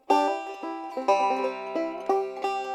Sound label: B pick